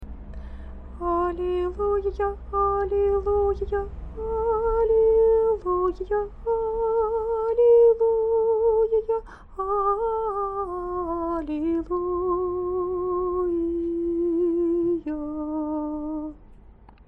halleluja, singing

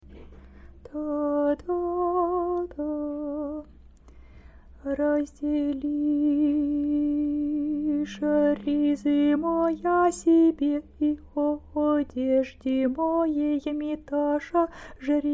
great friday morning prokeimenon, singing

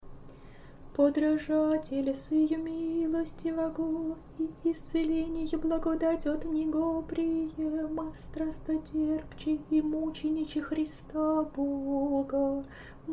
panteleimon kontakion, singing

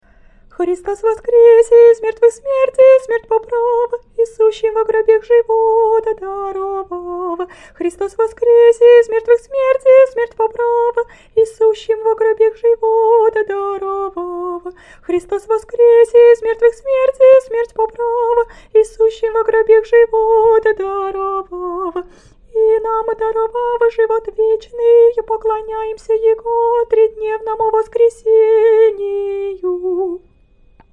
easter troparion fast, singing

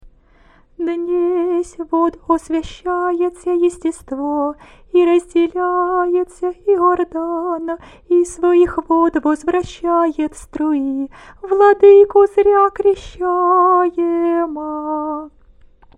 theophany water stichera, singing

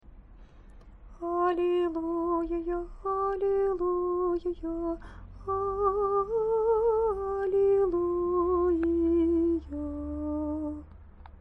halleluja, singing

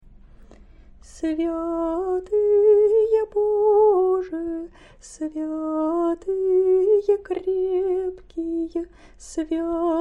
bulgarian trisagion, singing

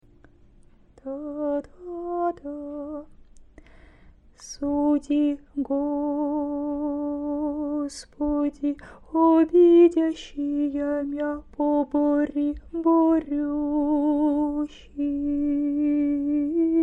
great friday evening prokeimenon, singing

